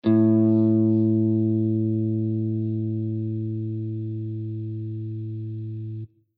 A 5th string, guitar

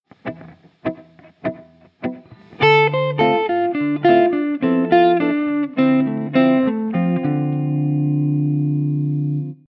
dorian, guitar

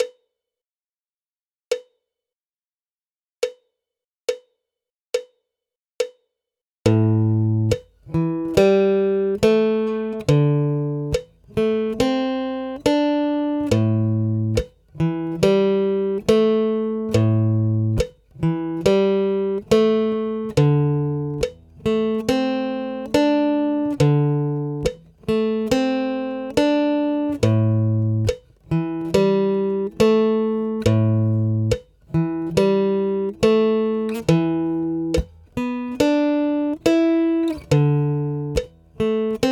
Riff Slow (Easy Guitar Riffs)

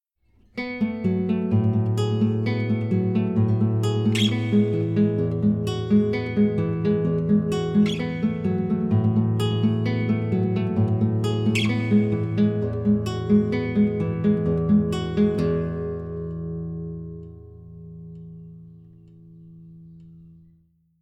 Right Hand, guitar

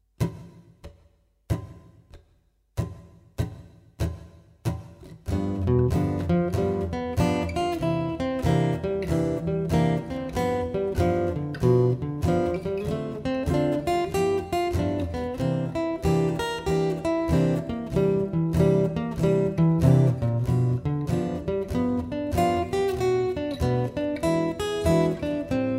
12 Bar Blues Arpeggio Study, guitar

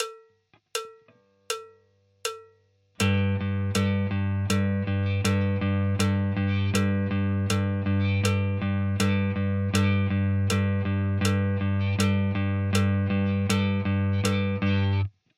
rhythm 8ths

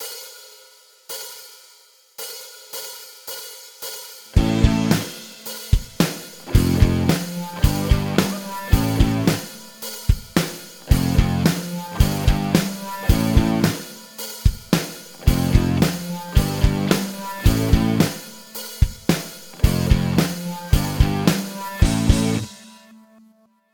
Riff Band, guitar

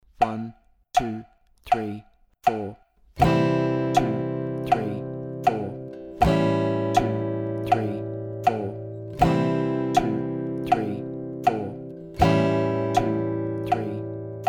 Basic Guitar Chords C A7

